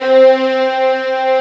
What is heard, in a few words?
VIOLIN C2